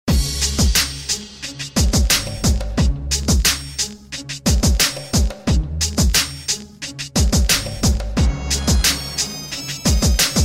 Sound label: Hard Hip Hop